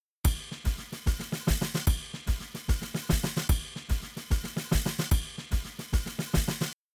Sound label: Drums Shuffle SN RC; WDR2